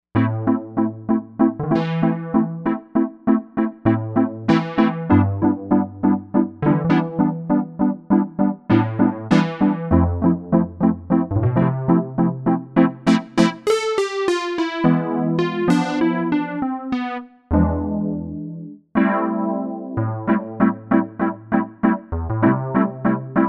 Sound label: 70's Keys; Novation UltraNova; Factory Patches